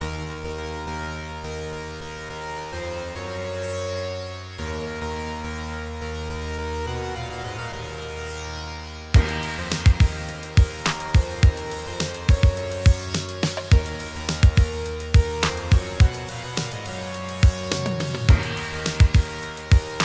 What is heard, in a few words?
bass line; dumb; weeklybeats-2020